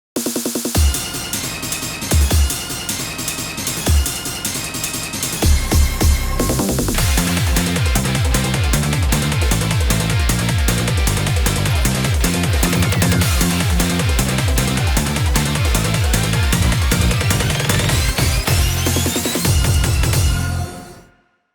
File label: OP, Instrumental